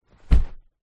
household large inflatable chair down on carpet

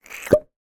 cartoon squelch pop; zapsplat

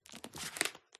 book open paper; PMSFX

